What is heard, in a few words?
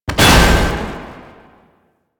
Tank Swing Attack Impact; Enemies; Abilities; Warframe; Lasrian